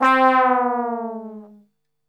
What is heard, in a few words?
C 2 TRBL OF, Brass; Best Service; Brass Super Section; 90 s Sample CDs